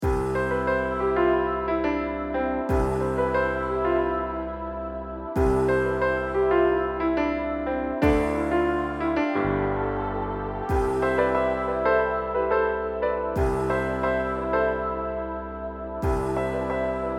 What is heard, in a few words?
180 loop strings